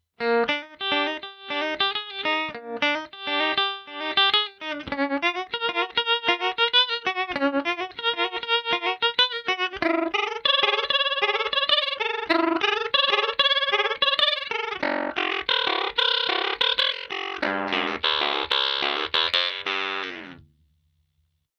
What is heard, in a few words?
ring modular; 随着Freq参数的逐渐增加; Ring Modulator; Guitar Rig 3; 随着Freq参数的逐渐增加，您可以听出效果的变化